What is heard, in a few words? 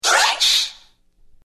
scratch